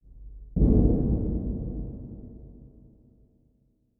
metal hit far

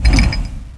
wrench big miss

